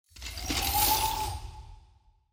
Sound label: designer scifi mechanical movement; SoundsCrate